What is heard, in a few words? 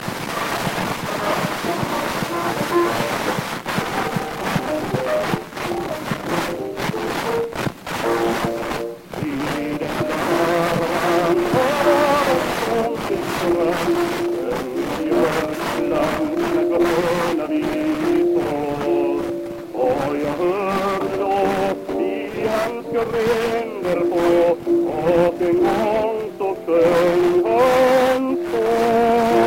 Gramophone